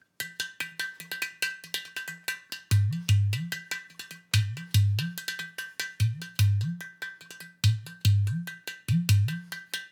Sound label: Udu riff; raji an ancient epic